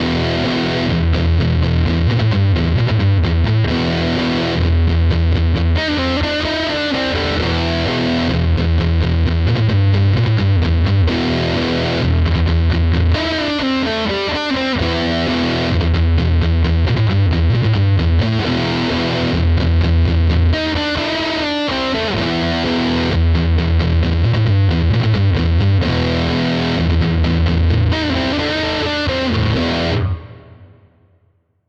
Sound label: Nux MG-30 Super Reverb EHX Muff